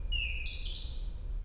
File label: bird